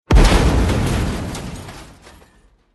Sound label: Boom; OvertakingLegend